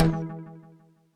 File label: Slot machine Roll Stop; uno